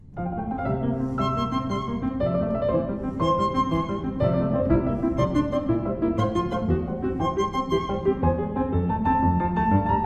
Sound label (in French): sonate pour violon et piano, allegro; BEETHOVEN; n9 a Kreutzer